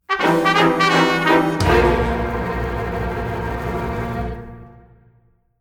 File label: Witch On Roof